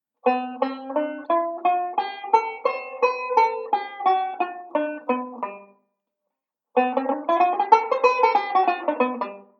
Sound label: Modal Sequence; Beyond Chord Melody Volume II